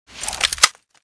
m16 draw; weapons; svencoop

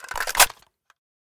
sks mag in; svencoop; ins2

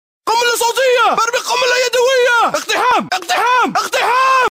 shout; svencoop; FZSaySound

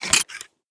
load, schrck; svencoop; ins2

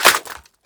mag out, m1928; svencoop; ins2

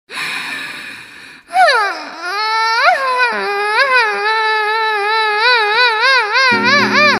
Polka Stretch; svencoop; Holo

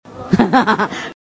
haha; svencoop; FZSaySound

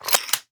m1a1; m1a1p; svencoop; ins2

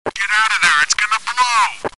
blow, radio; svencoop; FZRadio

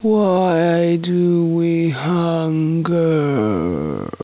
zombie idle; svencoop; hunger; hungerzombiefem

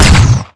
biorifle fire; svencoop; custom weapons

